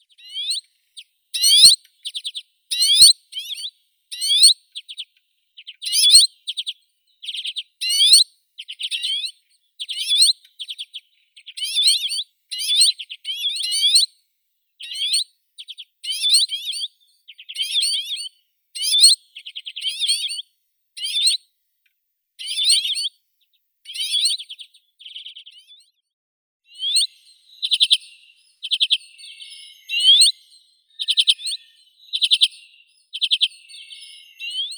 chechetka obiknovennaya acanthis flammea

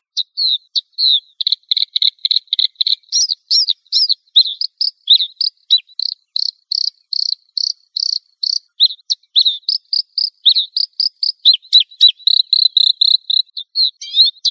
varakushka luscinia svecica, zvuki prirodi

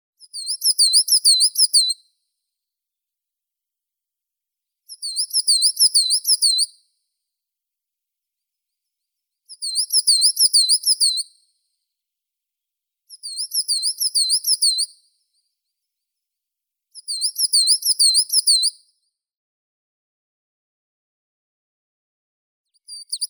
moskovka periparus ater

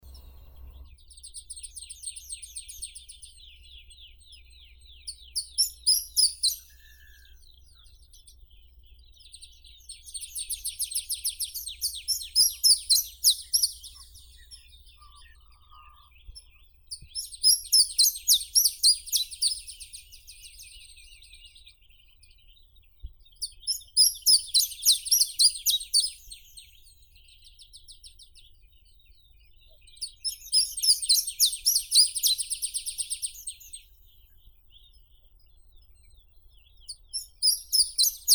penochka tenkovka gornaya phylloscopus sindianus

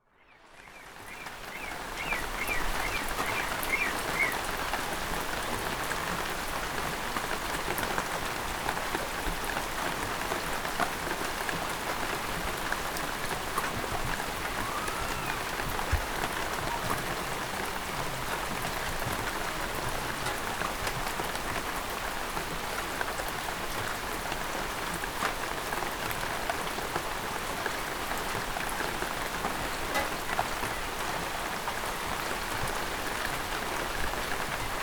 dozhd i ptitsi

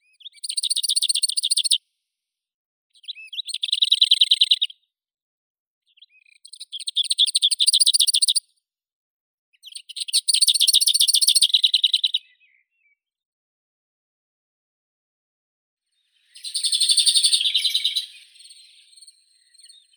slavka melnichek sylvia curruca, zvuki prirodi

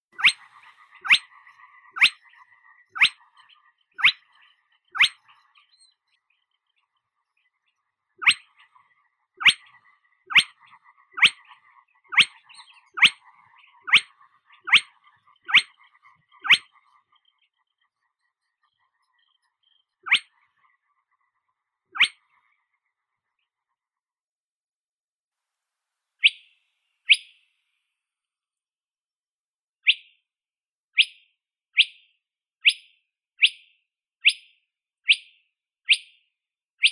pogonish porzana porzana; zvuki prirodi

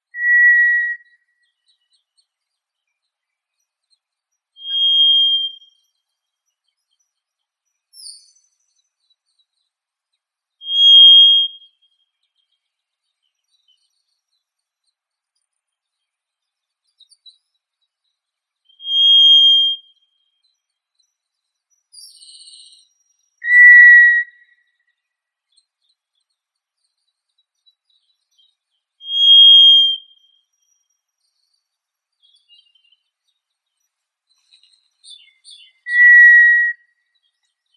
drozd pestrii zoothera dauma

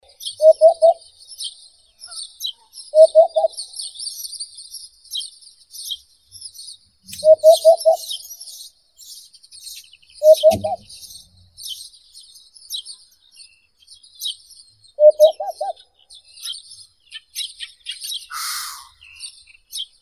udod upupa epops

